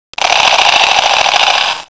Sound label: convoy gatling; God Fire Convoy; Gatling gun sound effect